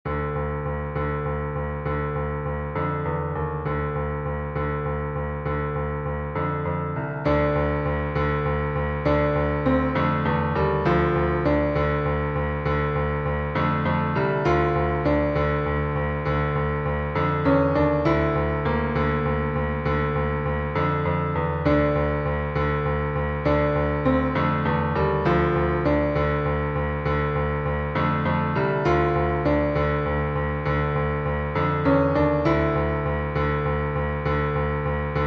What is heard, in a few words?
main theme for solo piano; Warcraft